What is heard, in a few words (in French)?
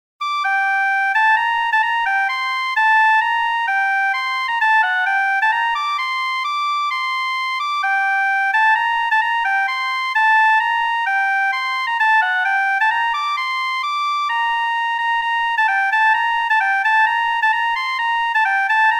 Bourrée; Bourrée d'Aurore Sand